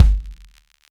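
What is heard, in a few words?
bass drum, left; Zero-G - Chemical Beats; BASSDRUM ZOO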